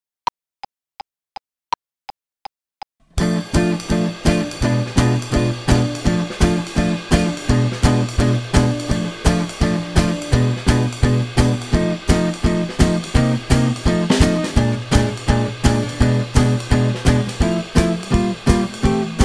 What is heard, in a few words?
drill in f